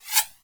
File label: bullet pass